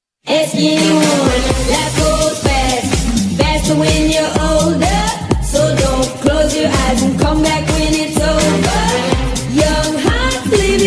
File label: Jingle